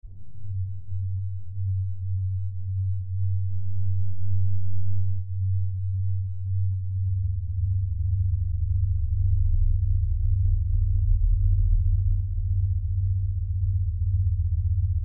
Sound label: wow flutter